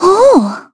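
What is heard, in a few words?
Laugh Madness, Korean; voices; heroes; Xerah; kings raid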